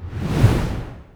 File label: color choose action entry